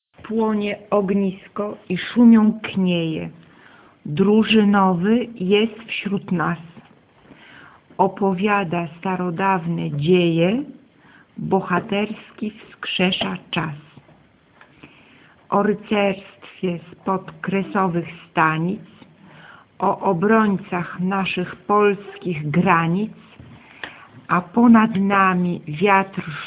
VOCAL; Plonie Ognisko

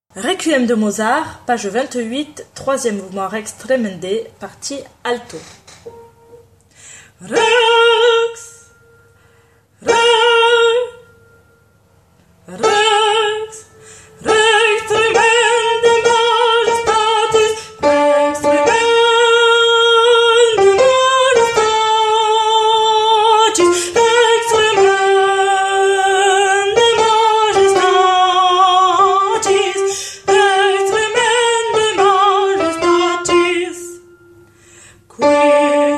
Requiem Rex Tremendae Alto; Requiem 33; Mozart

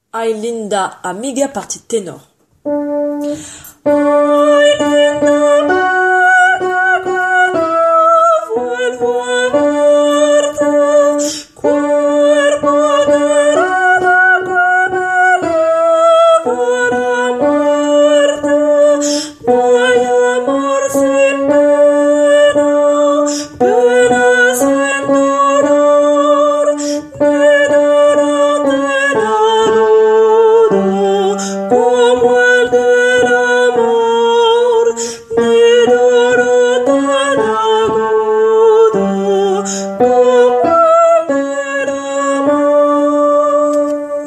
Tenor; Ay Linda Amiga

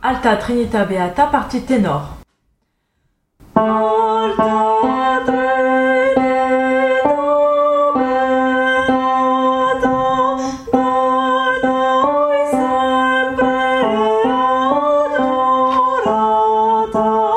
Alta Trinita Beata Tenor; anomyme XVs

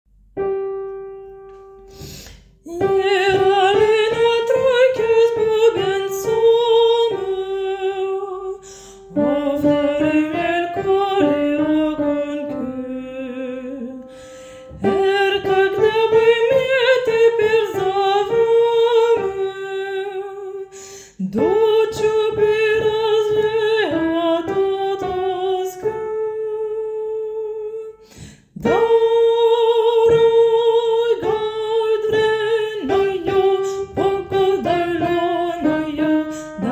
tenor

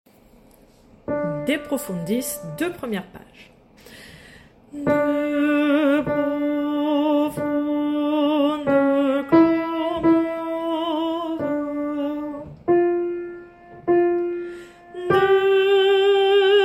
soprano; De profundis page 2 et 3

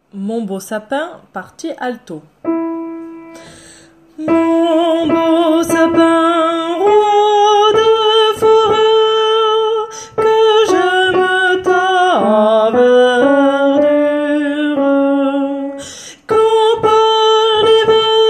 alto; Mon beau sapin

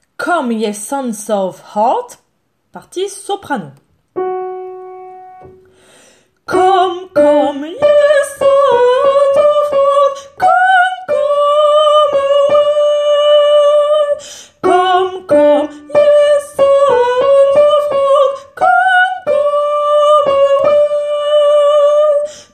Come ye sons of art soprano

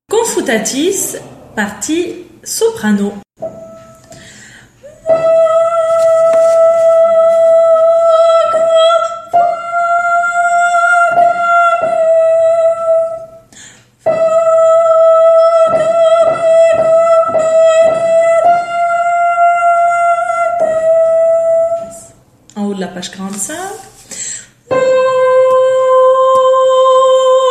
Requiem Confutatis Soprano; Mozart

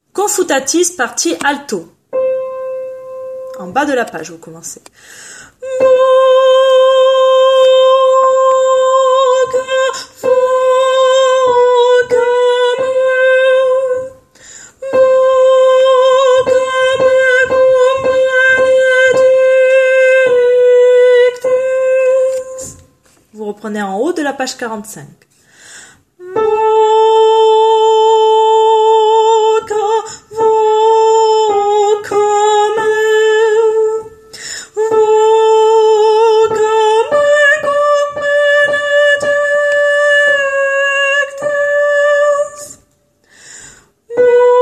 Requiem Confutatis Alto; Mozart

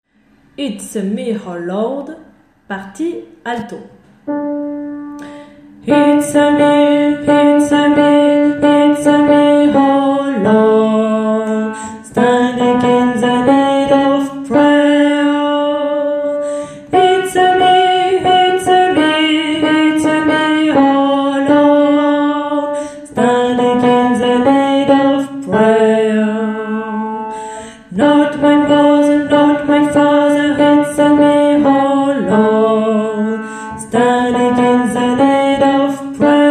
Alto

